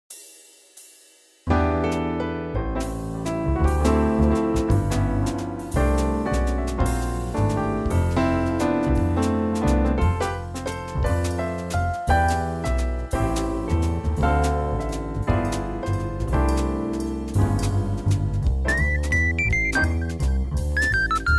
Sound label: jazz motif